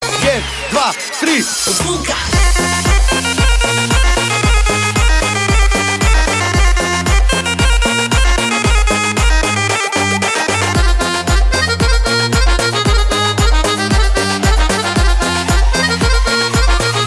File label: Balkanbeat